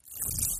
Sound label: velcro tear